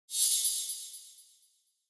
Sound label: Fairy Spell Magic Sparkles; Alan Dalcastagne; Fantasy World